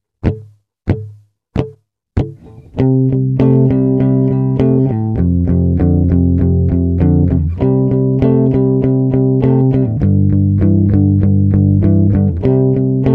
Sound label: 4 bar, Pentatonic; pentatonic fun